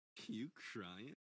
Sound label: You Crying; Goal Sound; Blue Lock Rivals; roblox